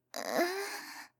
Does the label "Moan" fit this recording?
Yes